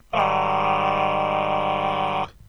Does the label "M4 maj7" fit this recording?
Yes